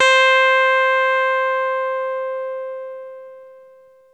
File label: Techno leads, hits and riffs